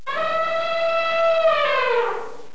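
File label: elephant battle; sf effects; sinfar haks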